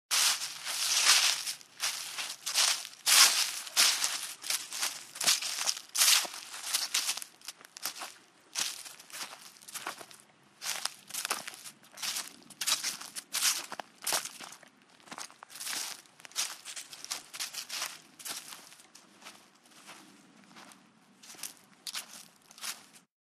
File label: Footsteps Leaves